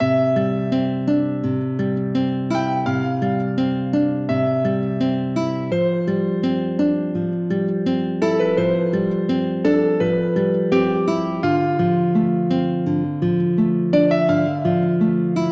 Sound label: bgm comic clear guitar